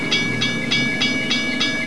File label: train bell